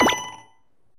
SE LEVEL REMASTER; maimai

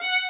violin

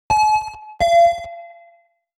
chime; 主播女孩重度依赖

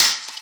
vox

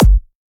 Dr Kick

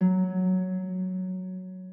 harp

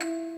musicbox

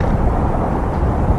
Wind Noise; 音MAD; Z会

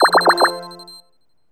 SYS COUNT 30 20 10; maimai

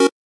Chord

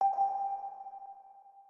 organ

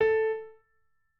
piano

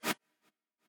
chord

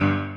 piano

